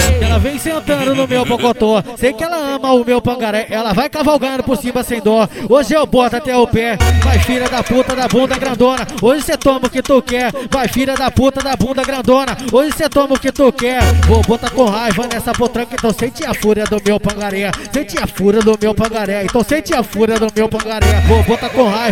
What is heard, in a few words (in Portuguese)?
funk sertanejo